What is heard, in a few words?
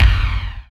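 bass drum, bass drum dance; Sound & Vision - Gigapack I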